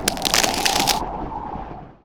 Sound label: ice wall slow; weapons; dota; invoker